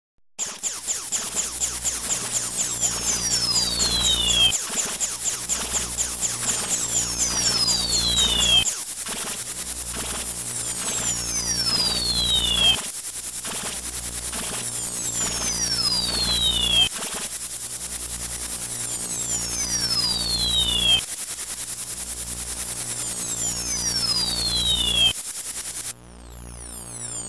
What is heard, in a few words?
Laser Ring